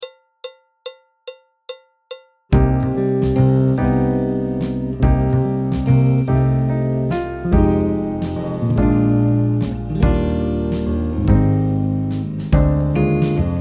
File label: alto, tenor, clarinet, flute, trumpet, trombone; Jazz Syllabus Level 3